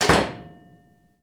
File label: Metal Door slam